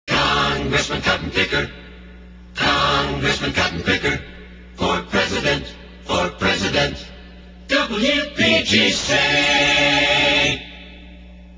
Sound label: jingles; congressman cottonpicker for president